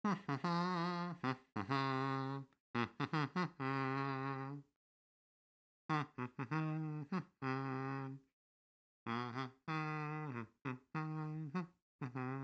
Humm; Hollow Knight: Silksong

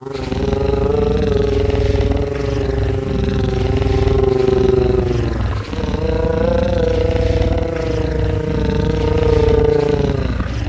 bell beast sing

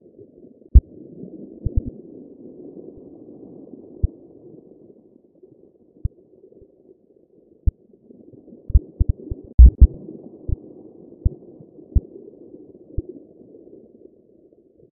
acid ambient loop vibration

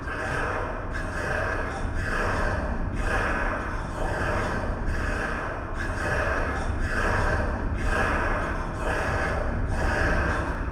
ant chant distant

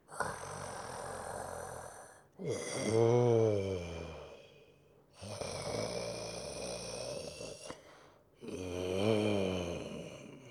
Giant Flea sleep loop; Hollow Knight: Silksong

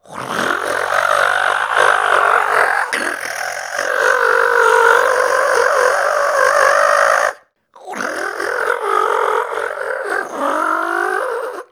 sing

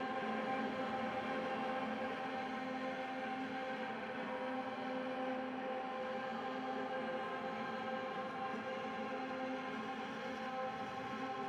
Drone

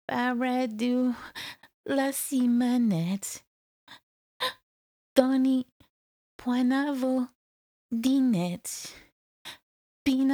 Sing New Scared

